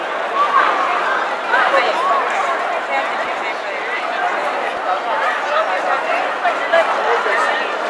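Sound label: crowd; Mafie 1 cz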